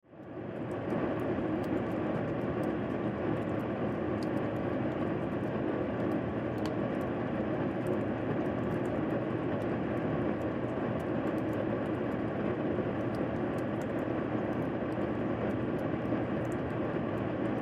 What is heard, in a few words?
Dryer Vent Outdoor, Dryer Vent Outdoor Water Drips; Household; Appliance